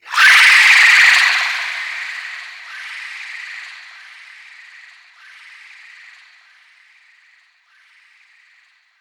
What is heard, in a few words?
Scream End